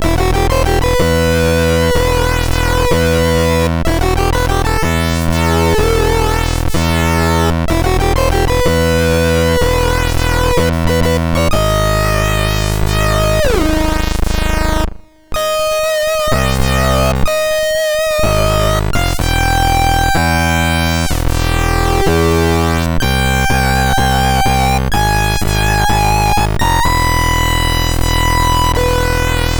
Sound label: sid MOS6581R2; Behemoth